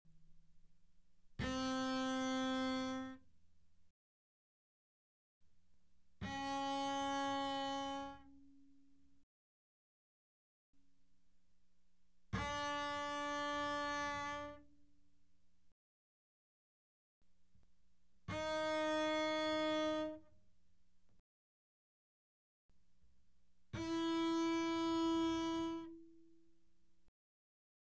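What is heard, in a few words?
Bass arco fortissimo sul G C4 G4, doublebass; Strings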